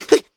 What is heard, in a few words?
jump; Scrat